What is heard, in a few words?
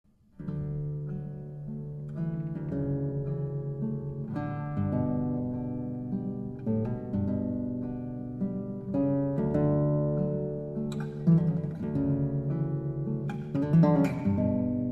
Lullaby; Berg